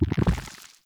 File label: Nunchuck Pulse; Warframe; Infested